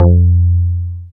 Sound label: BAS FM DX-7